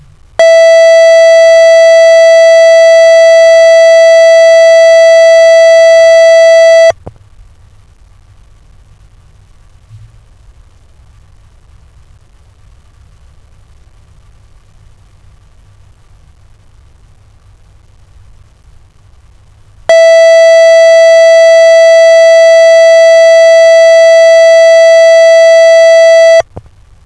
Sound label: tone